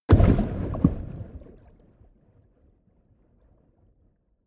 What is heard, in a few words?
underwater hard